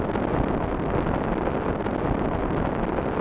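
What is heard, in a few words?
character Aflame loop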